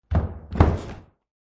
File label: Footsteps on wooden stage; Human